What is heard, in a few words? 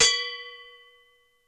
AGOGO; Crypton - DrumBase5000